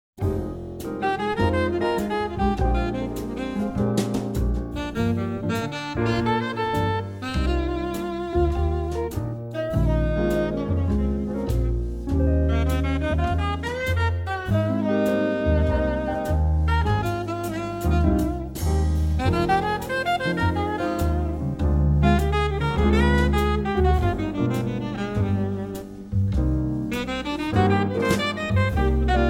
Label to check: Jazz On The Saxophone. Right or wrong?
Right